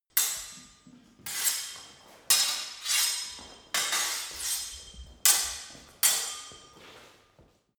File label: sword hit sword sequence long